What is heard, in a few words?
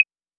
808 Clvs, Roland MV-8008; Roland MV8800